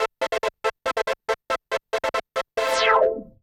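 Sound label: synth electro 140 A; keys; Techno2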